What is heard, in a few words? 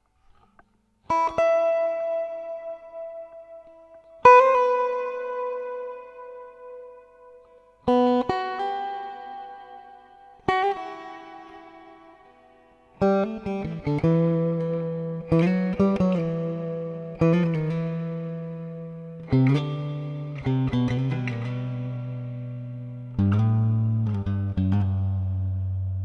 yamaha apxt-1a, guitar